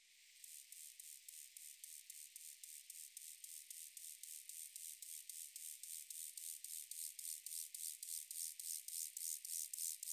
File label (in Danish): sydlig markgræshoppe